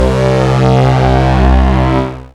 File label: BAS, Eurotech; Sounds Good